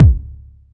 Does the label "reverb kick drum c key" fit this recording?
Yes